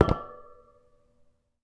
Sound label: Metal